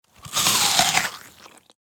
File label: Biting an Apple